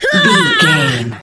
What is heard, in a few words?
voice female death